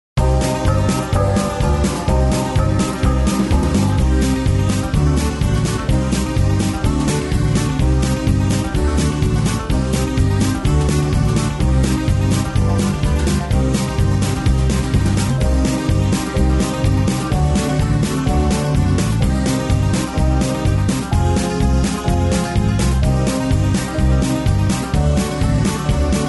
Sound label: rhythm track, square dance music